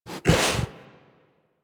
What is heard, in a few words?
Interact Sniff, Kubrow; Warframe; Tame